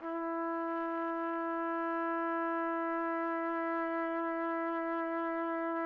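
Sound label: trumpet; Roland - LA Composer vol. 3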